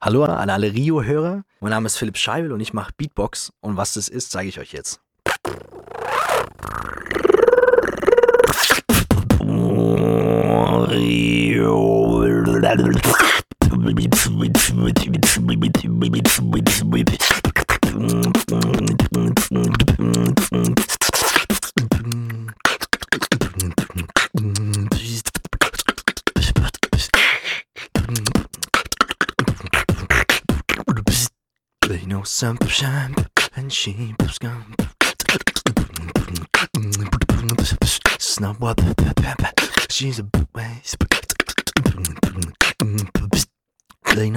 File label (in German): Beatbox; Vorstellung